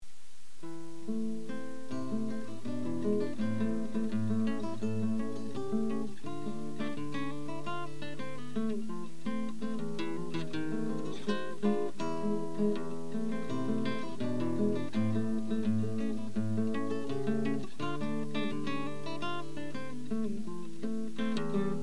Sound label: Chorus